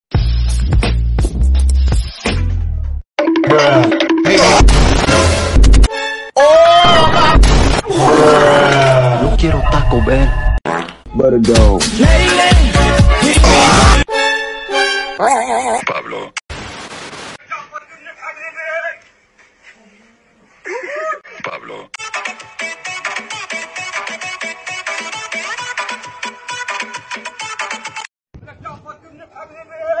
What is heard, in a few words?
meme sound